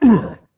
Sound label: Wound; Captain Rupert